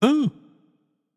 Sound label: surprised; smite; shiva